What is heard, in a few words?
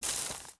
FS Weeds, footsteps